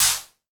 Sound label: Wider Clap